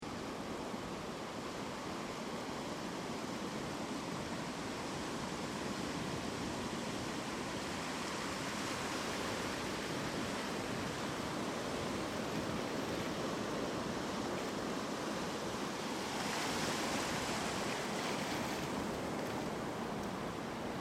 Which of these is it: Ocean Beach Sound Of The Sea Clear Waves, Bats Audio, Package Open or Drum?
Ocean Beach Sound Of The Sea Clear Waves